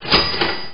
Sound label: Cash Win; Pub Quiz Knockout